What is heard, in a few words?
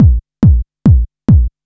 Kik Loop, Dance, Beats